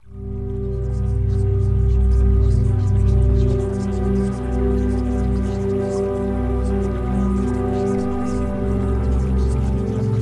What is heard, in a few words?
Music; Reality Shifting